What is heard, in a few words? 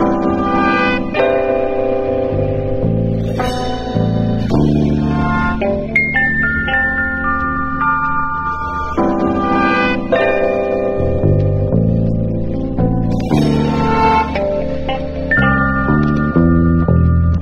keys, phonky loops